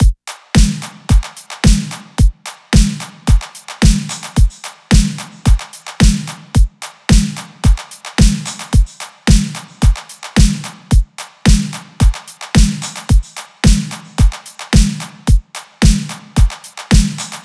drums 110, Drum Loops, Full Drums; Turbo; Slate; MIDNIGHT Sample Pack

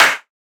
clap crunch; Drum Sample Pack; One Shots; Claps; Slate; SEISMIC

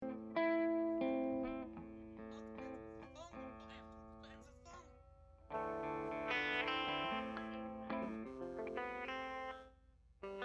Strings Mix

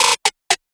perc filler; Hip-Hop; Drum One Shots; SD CAICOS Hip-Hop Sample Pack; Slate

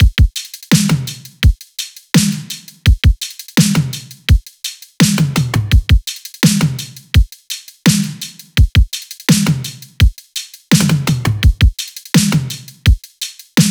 drums 84, Drum Loops, Full Drums; Slate; MIDNIGHT Sample Pack

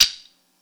percussion oneshot sticks; Pop; Drums; SD PASADENA Pop Sample Pack; Slate

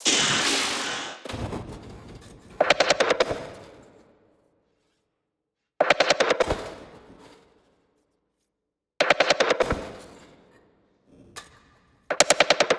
perc 150; Drum Sample Pack; Loops; SD SEISMIC; Slate

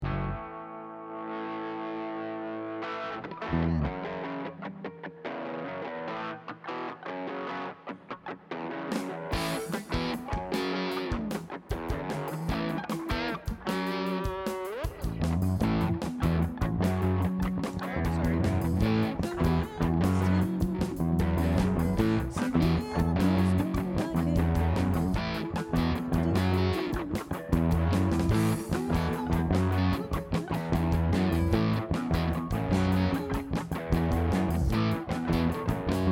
Strings Mix

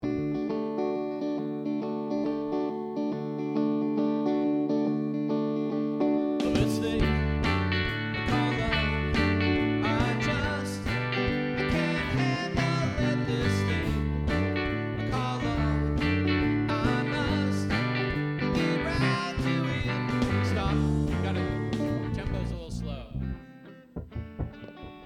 Strings Mix

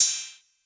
cymbal choke, Hip-Hop; Drum One Shots; SD CAICOS Hip-Hop Sample Pack; Slate

